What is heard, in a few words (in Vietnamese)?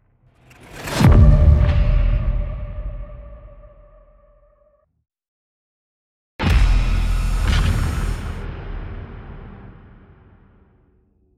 Am thanh ly ky hoi hop trong phim